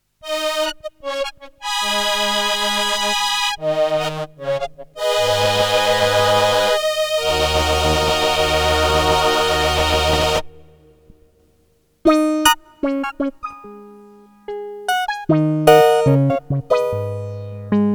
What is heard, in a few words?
Polymoog Presets; Moog Polymoog synthesizer